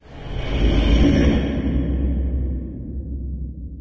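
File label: distant growl, caves; ambient, levels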